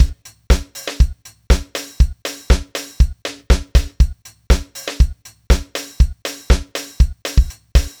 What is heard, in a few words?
house 4 bars, Beats, Nu Jazz House, 120; PowerFX